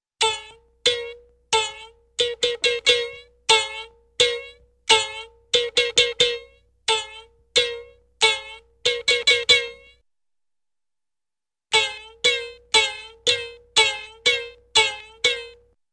Musical Two-toned Guitar String Plucks; Cartoon; Hollywood Edge; Cartoon Trax

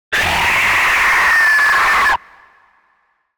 Alien Screech Scream - Monster Dinosaur; Hollywood Edge; sound designer's toolkit v2

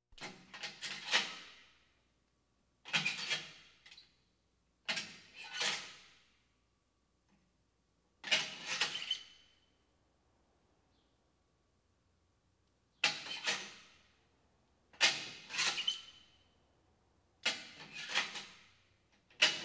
Police, Fire engines; Valentino Production Sound Effects Library CD50

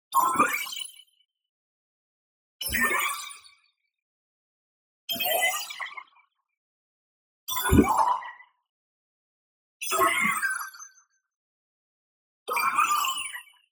tonal, spectral voice rise; boom library; Anime Essentials

